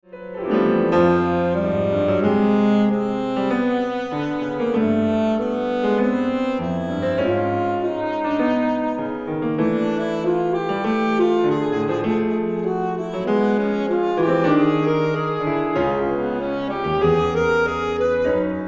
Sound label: Sax Sonata; CHAMBER WORKS